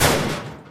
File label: door metal thin open; Garry's Mod; hl2